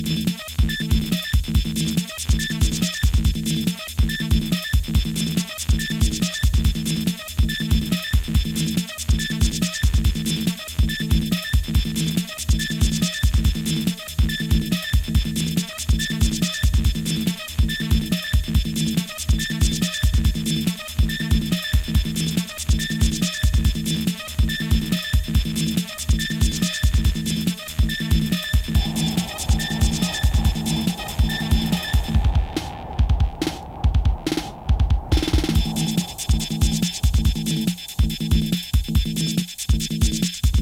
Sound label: electro